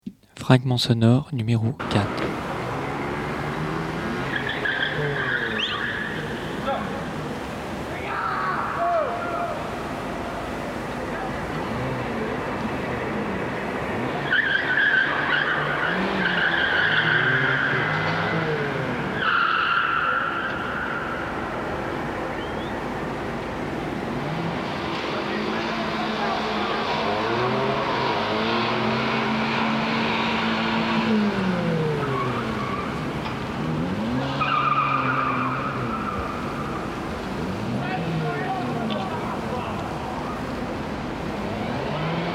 proxemie acoustique habitat rodeo nocturne

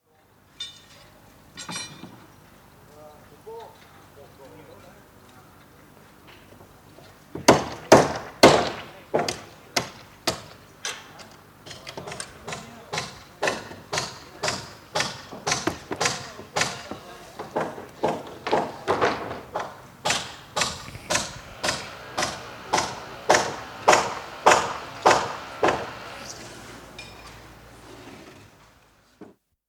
marteau, chantier; effet creneau; Culture sonore

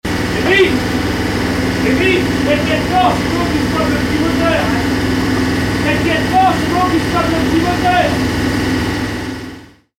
repetition chalutier

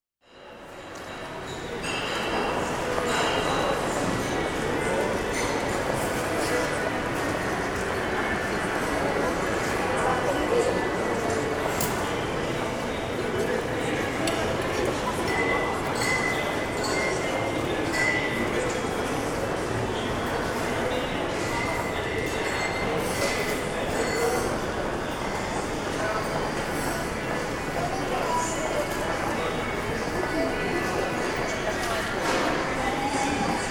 Confusion et saturation de l espace; Metabole

